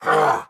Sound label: Prisoner Damage